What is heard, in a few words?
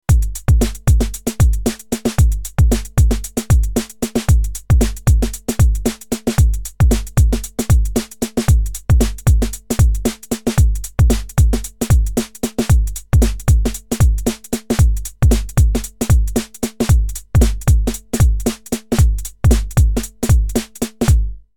shuffle